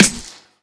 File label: SNR